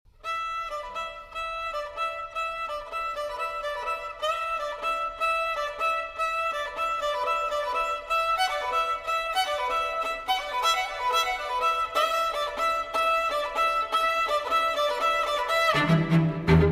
String Quartet; No. 1 Pictures From Warsaw VIII. Present

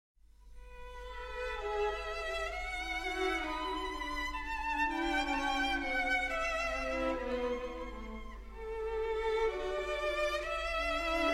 String Sextet No. 2 in G Major; Op. 36; Brahms

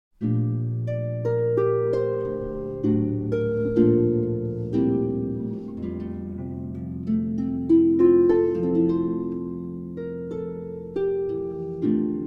Harp; Nos Galan (Deck The Halls)

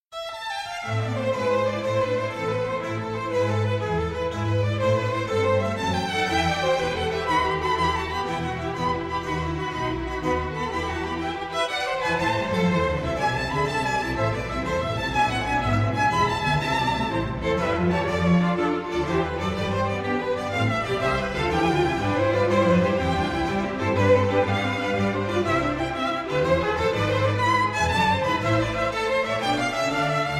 Violin Concerto in A Minor, Allegro Assai; Bach, JS; No. 1; BWV 1041

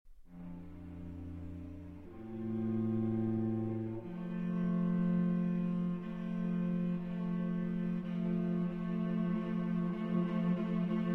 String Quartet

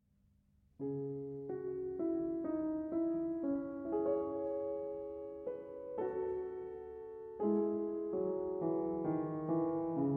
Prelude in C-Sharp Minor; Prelude & Fugue in C-Sharp Minor, BWV 849

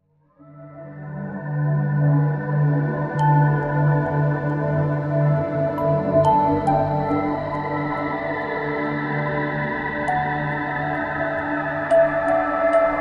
192 Hz

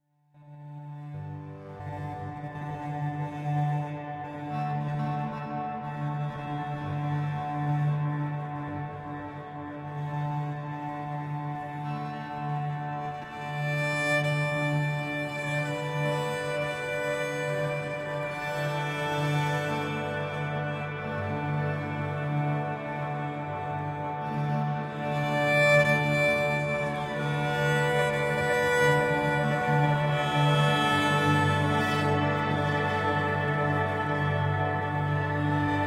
Cello Ambient Improvisation

